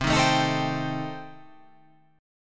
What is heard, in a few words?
C Minor 6th C